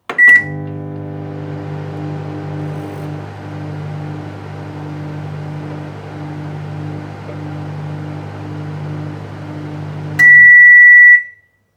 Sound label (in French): microonde; deambuler